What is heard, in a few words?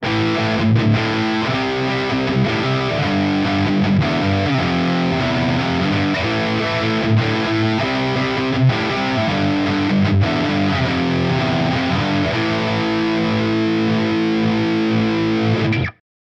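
Rhythm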